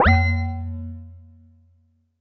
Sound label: U220 FX Set, Drum Machines; Roland U220